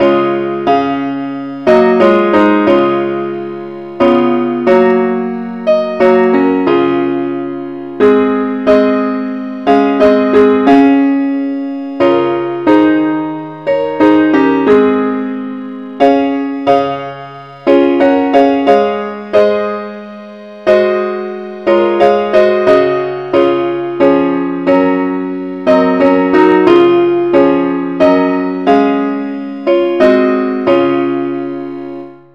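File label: parts only key C sharp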